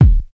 original bass drum d sharp key, kick drum single shot tuned to the D# note; kick drum samples; one shots